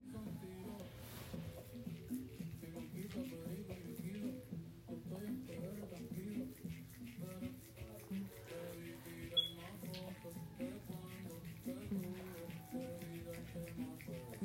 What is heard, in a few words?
Spitting, Wet noises, F4M; Late night sesh